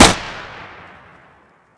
m1a1, weapons thompson; m1a1 2; Tommy Gun Drop

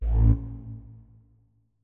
flute notes; Hideout Furniture

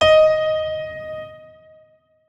notes, keyboard piano; Hideout Furniture

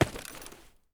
default, human step; Soundscape Overhaul

